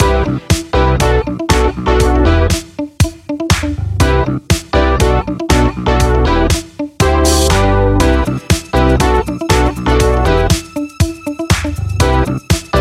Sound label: Retro Funk; dharma worldwide